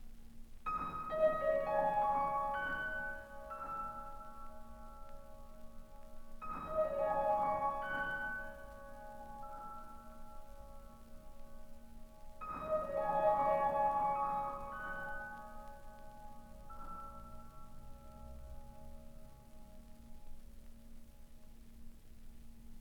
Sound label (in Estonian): Improvisatsioonid